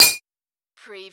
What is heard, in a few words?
Button Sound - Metal Grille Fall